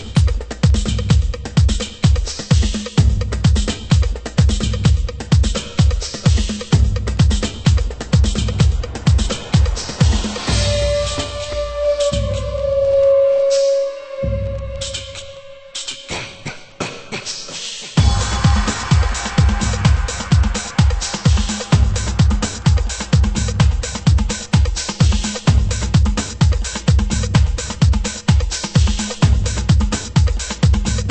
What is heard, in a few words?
drum